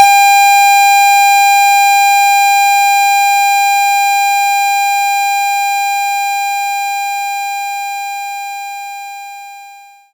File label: Square Wave